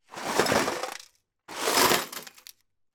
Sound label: glass broken bits in metal tin movements small debris; zapsplat